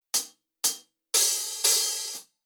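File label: Rhythm Disco Hi-Hat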